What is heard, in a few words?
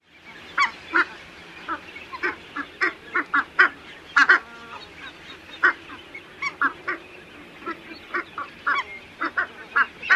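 Ross's goose typical calls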